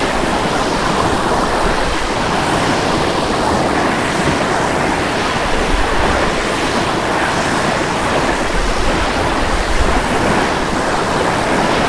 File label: waves